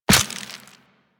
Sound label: Walk Bone, Footsteps; Warframe; Operator